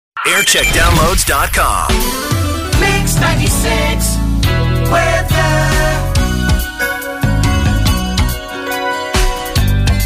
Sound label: Jingle Montage; Mix 96 Aylesbury; JAM; 1998; 1990s